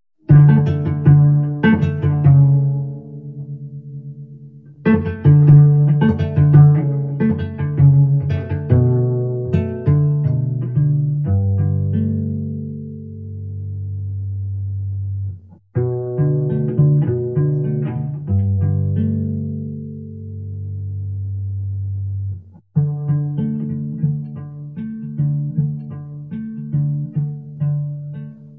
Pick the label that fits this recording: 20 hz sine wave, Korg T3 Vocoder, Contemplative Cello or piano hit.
Contemplative Cello